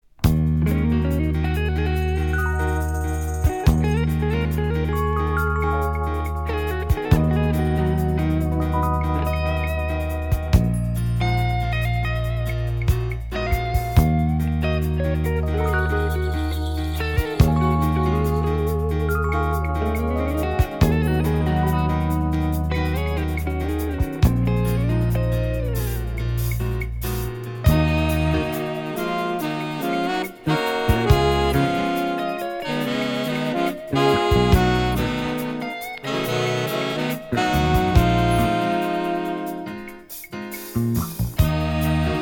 SAX ENSEMBLE

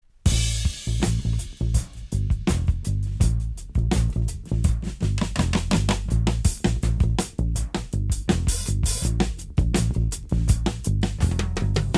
JAZZ ORCHESTRA

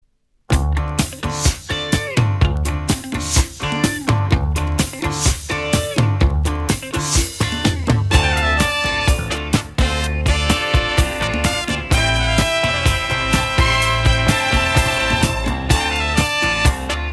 DISCO

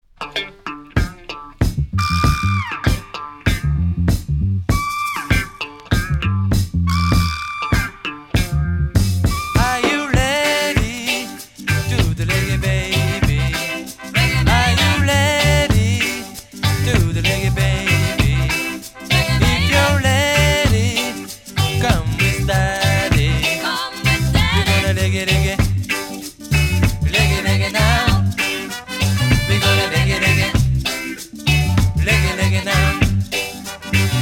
REGGAE

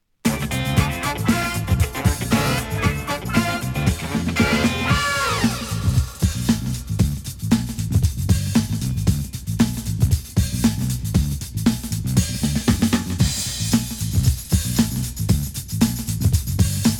BONUS BEATS

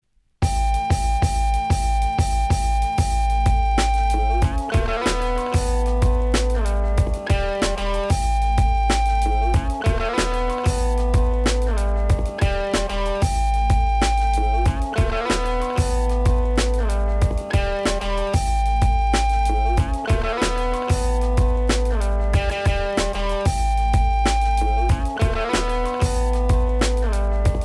GUITAR

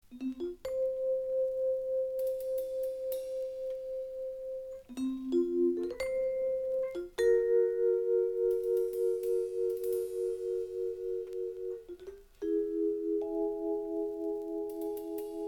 VIBRAPHONE

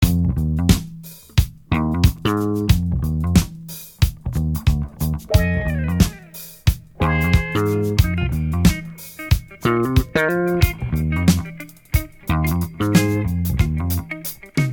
SAX ENSEMBLE

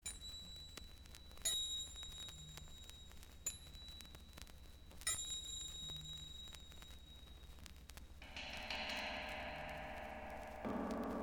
LATIN PERCUSSION

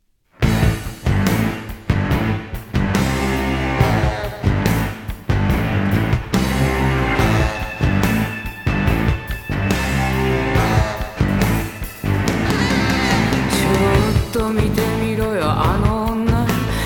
SITAR

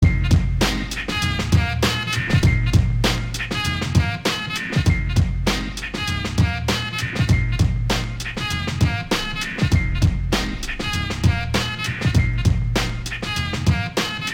INSTRUMENTAL

